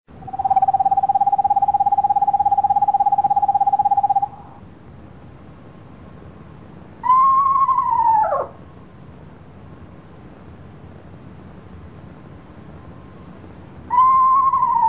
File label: Eastern Screech Owl; summer; Owl Calls